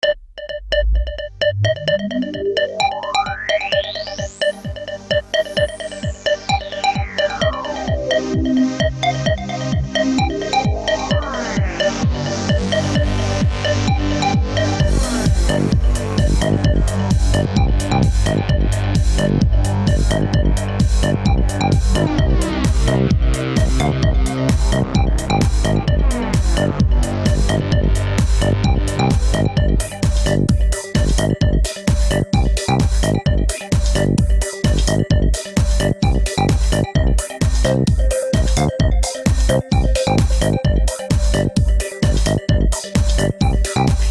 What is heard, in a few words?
Boss fight; Block Breaker Deluxe 2